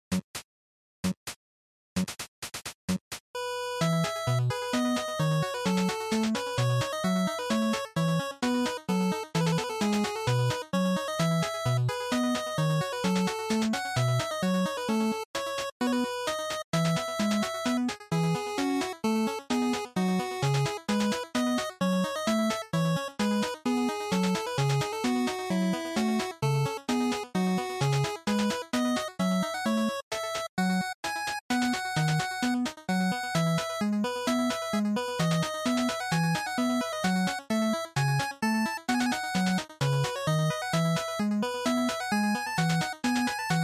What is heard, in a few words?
NES RPG Title